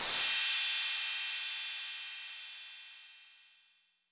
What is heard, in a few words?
Cym A 808 Sat Decay B, Cymbal, Multi Saturated